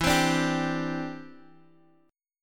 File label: E Minor 7th Sharp 5th, Guitar, Half Step Down